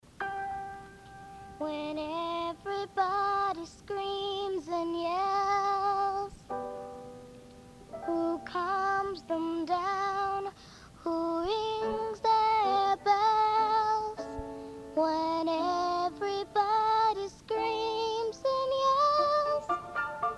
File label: Screaming And Yelling